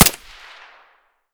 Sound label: gyrza silenced far; Escape from Tarkov